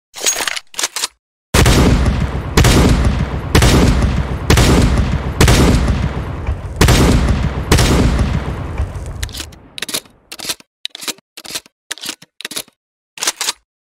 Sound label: sawed-off; csgo